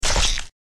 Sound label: slice; slice fruit